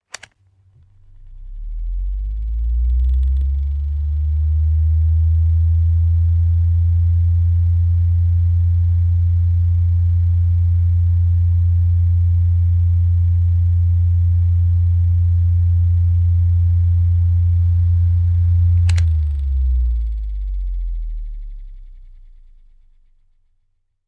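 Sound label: fan portable low; fans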